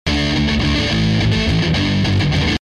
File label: guitar